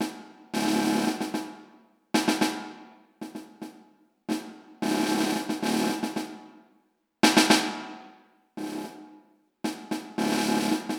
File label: Snare; Band Audition; Snare Drum